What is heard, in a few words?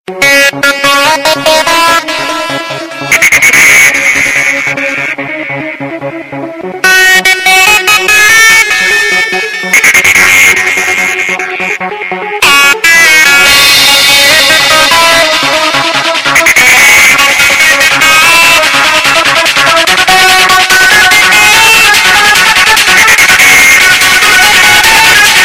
Tecono